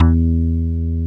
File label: PULL BAS